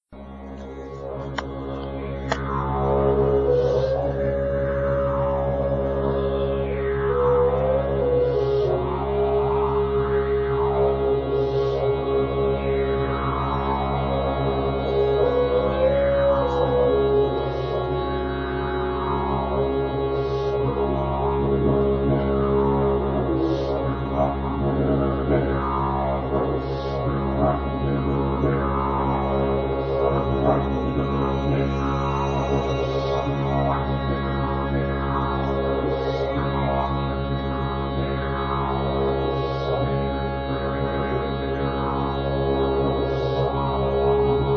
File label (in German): Didgeridoo Flöte Shrutti